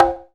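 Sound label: CONGA HI OPEN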